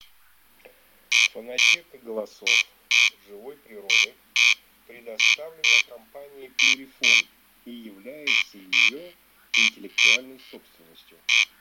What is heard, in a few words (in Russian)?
Коростель; plurifon